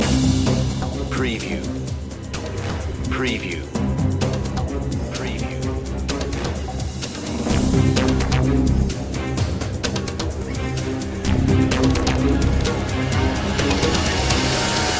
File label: digital voodoo loop; orchestral soundtrack; action chase